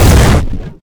Rocket Explode; Game rip; MiSide

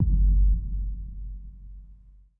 Day Show Bass; Game rip; MiSide

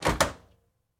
Open Toilet Door; Game rip; MiSide

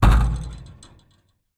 Ventilation Hit; MiSide

